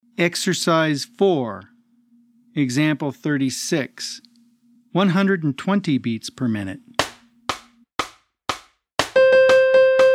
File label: 120 BPM